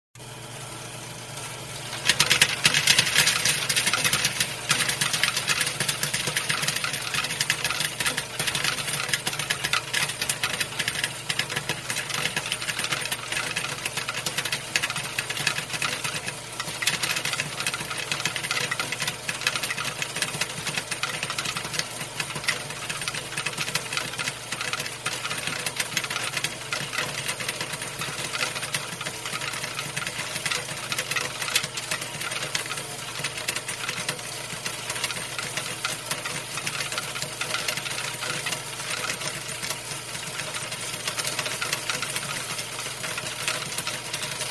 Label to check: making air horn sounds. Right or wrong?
Wrong